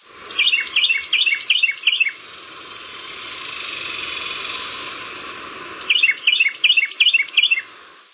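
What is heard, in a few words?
chirping by a motor road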